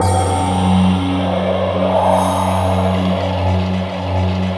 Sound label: F#2, bamboo